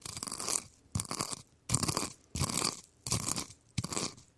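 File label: Grinding Mortar Pestle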